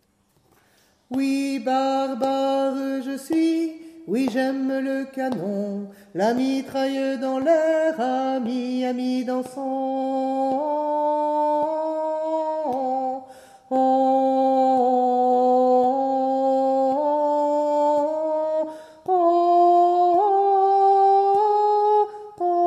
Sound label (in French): danse hommes couplet